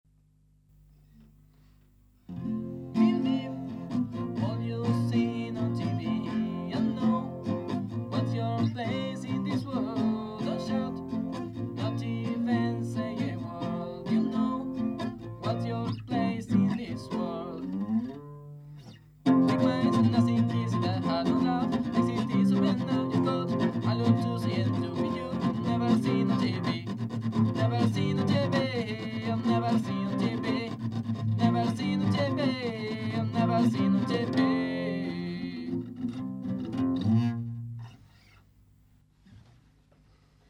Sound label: acoustic